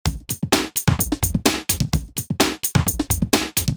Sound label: original high